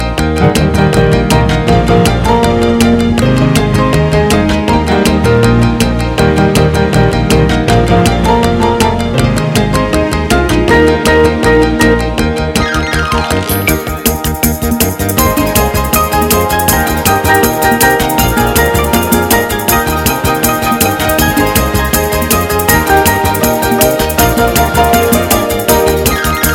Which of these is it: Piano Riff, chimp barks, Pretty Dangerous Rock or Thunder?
Pretty Dangerous Rock